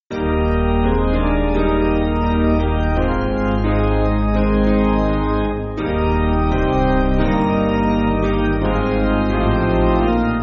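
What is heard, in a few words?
Piano, Backing Files for Churches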